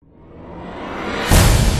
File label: Medium Music Start; Alien Breed Trilogy; PS3 Windows Xbox 360 gamerip 2011